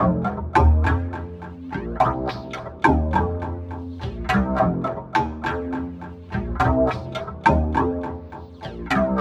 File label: Remixed Acoustic Bass; Sonic Foundry (Sony Creative Software); Leo Cavallo Bass Taster